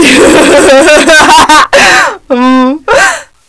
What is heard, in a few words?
cheer; zmParadise